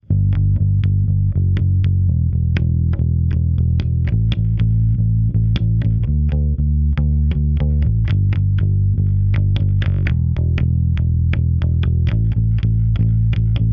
wet Bass

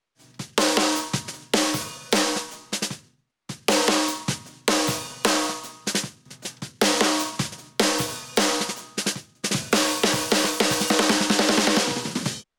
wet Snare

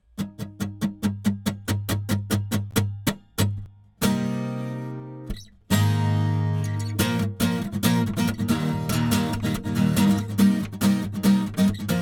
wet Acoustic Guitar

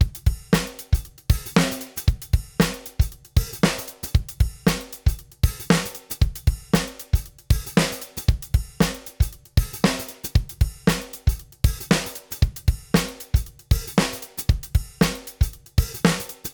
dry Drum Bus

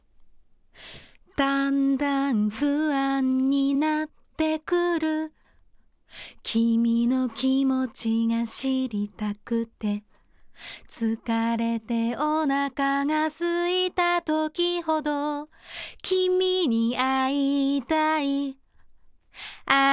wet Vocal Female

